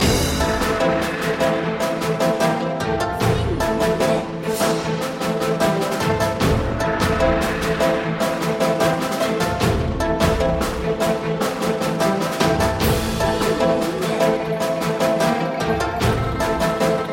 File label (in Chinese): Boss战